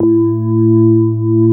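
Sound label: CX3 organ, Keyboards Old School; USB Soundscan vol.09; AKAI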